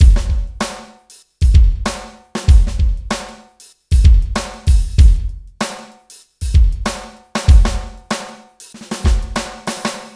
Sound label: HYPE TYPE 96